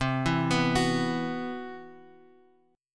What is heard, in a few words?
C Major Sharp 11th, Bass Standard; CM#11 arpeggiated; JGuitar